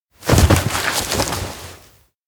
roll ground; aino; anemoi

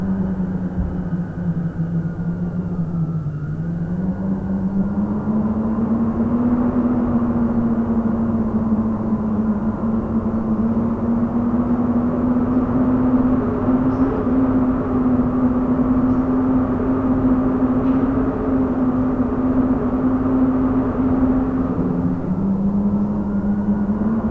wind howling; anemoi

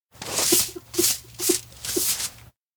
cleanup collect wipe windows; anemoi

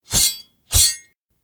sword swing; anemoi

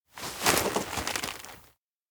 leash tight thrash; jet; anemoi パッケージ版

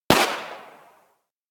pistol shot; anemoi

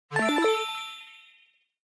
item get fanfare; anemoi

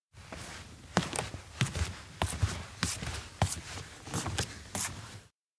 steps indoor vet walk depart; anemoi パッケージ版 1.1

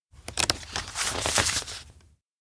sketchbook page turn slow; anemoi

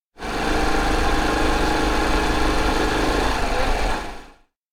bike run; anemoi

